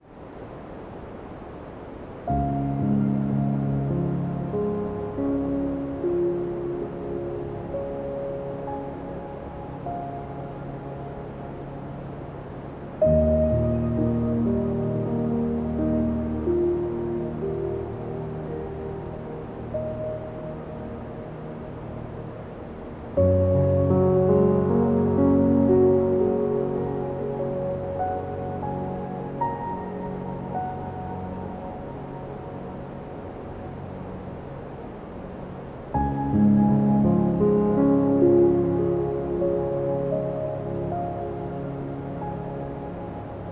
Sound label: solitude piano white noise